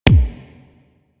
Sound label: SMG Punch, weapons; Protea